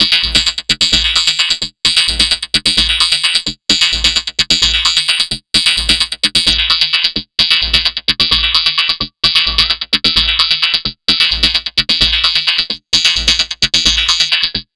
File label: Drums Strange